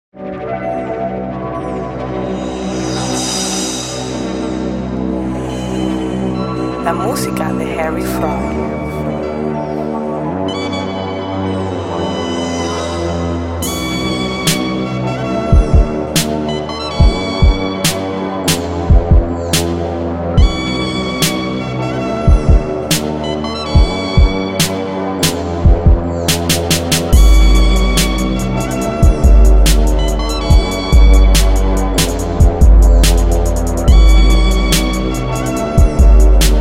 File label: Instrumental